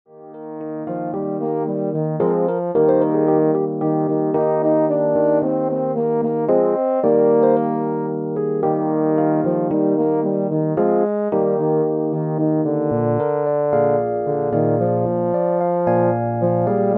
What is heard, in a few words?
Euphonium